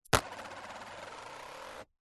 money coins x2 australian 2 dollar coins set down on plastic lid and spin